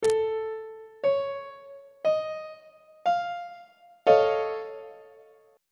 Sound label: f augmented 7th 1st inversion on treble clef